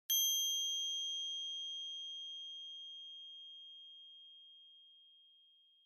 Triangle Single Long

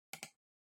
PC Mouse Far L

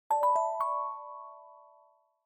Smartphone UI Sound Dry

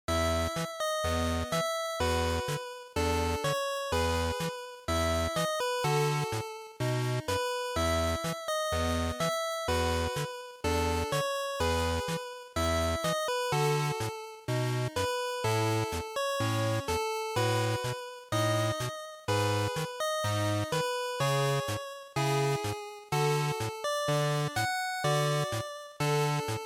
GB RPG Town

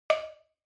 Countdown

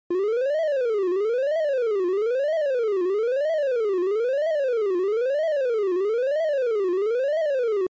Arcade General LFO

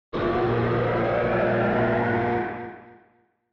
Retro Anime Mech Equip Long

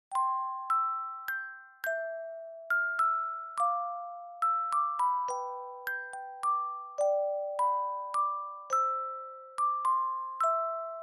Fuyugeshiki Dry Fast

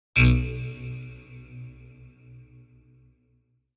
Cyber

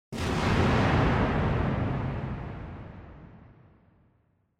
Explosion Long Far

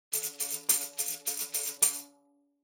Tambourine Pattern

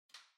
Light Switch American Toggle Far Hall Gentle

